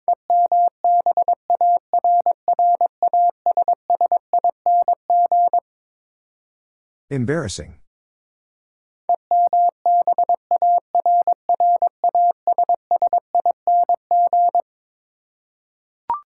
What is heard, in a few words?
Twelve Letter Words 22wpm, morse code